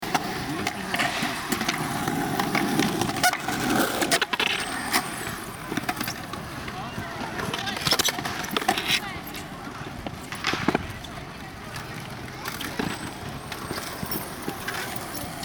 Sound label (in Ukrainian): zvuky skejtbordiv v urban parku; na vdng